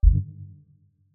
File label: UI, pure, warm, simple, quick, tonal, deaction; Start Select